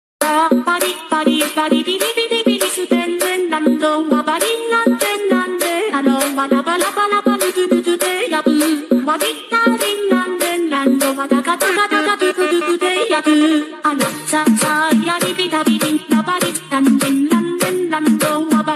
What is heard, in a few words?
Mobile Ringtone